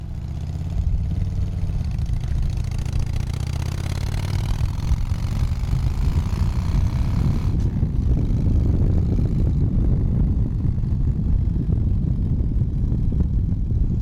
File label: Harley Davidson